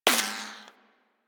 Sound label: Bullet Impact Ricochet; Warframe; Weapons